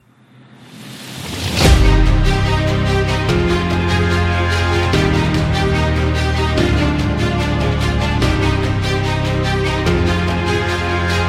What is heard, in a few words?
Epic Dubstep Power; epic music vol 3